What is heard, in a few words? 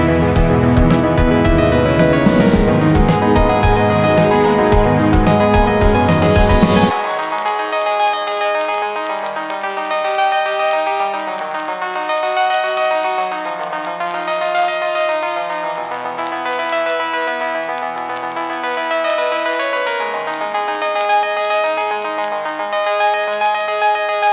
vinyl wavering